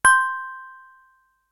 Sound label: toy chime